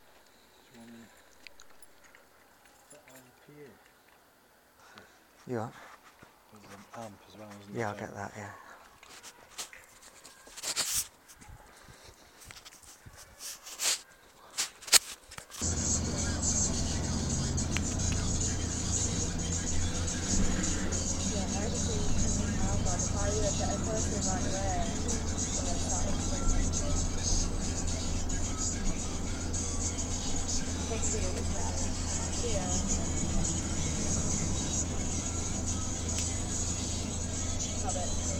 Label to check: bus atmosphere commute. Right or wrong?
Right